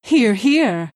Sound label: pain move; Queen of Pain